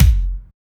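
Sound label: BOTTOMOUT kick; Drums In Your FACE!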